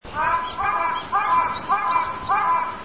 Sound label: crow